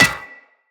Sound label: Melee Staff Impact Generic